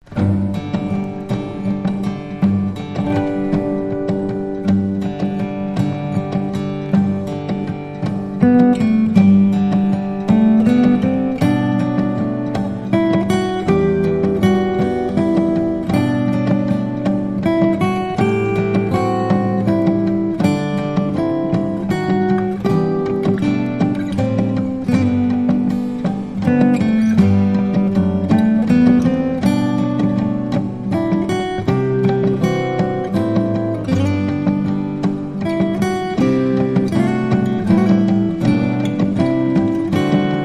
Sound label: The First Noel without Jingle Bells